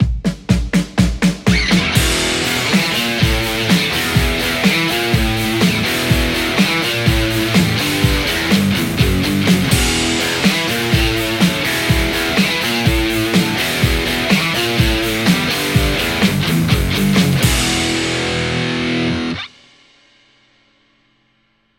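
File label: Stratocaster; poulin lextac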